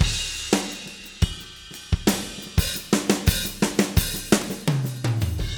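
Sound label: 86 bpm, beat loops; Zero-G; Monster Beats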